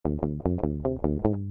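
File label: sound of a spy working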